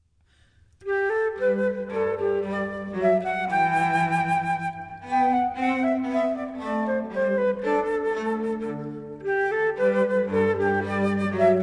Flute Cello Gavotte

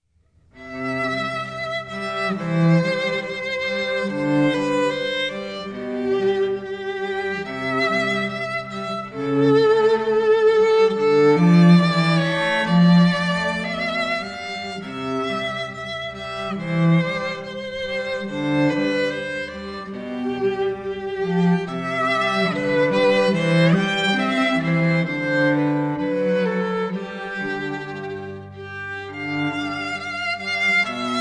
Violin Cello Andante

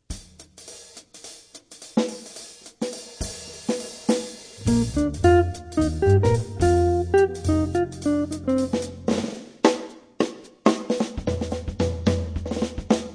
Jazz Trio

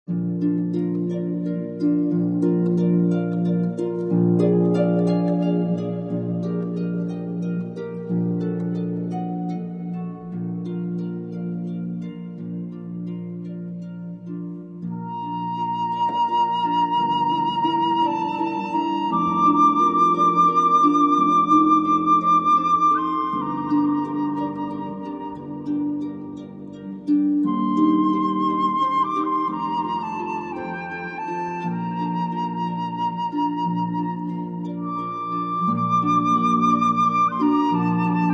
Flute Harp

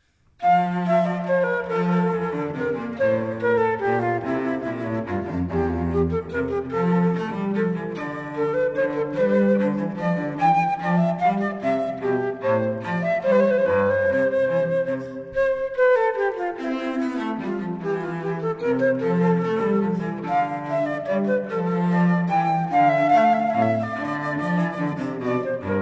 Flute Cello

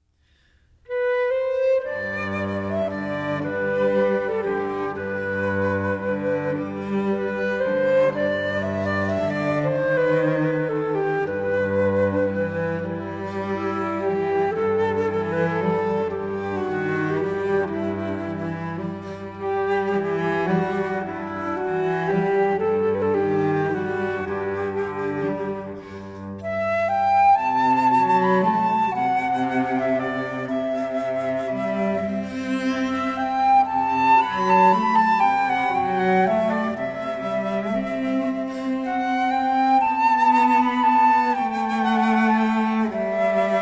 Flute Cello

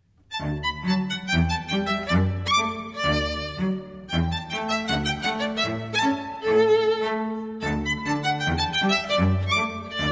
Violin Cello Gavotte

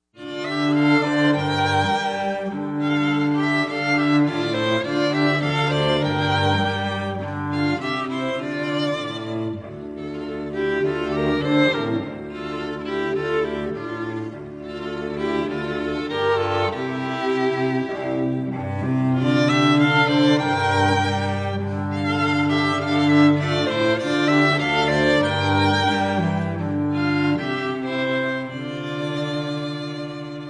String Trio

